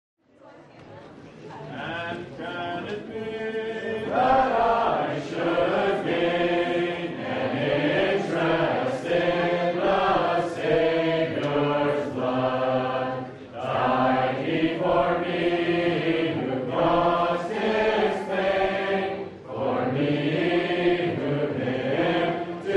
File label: Amazing Love hymn, Hymn Sing; 2015Conference